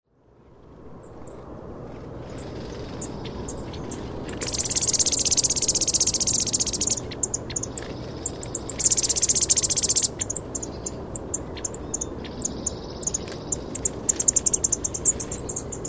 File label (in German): zaunammer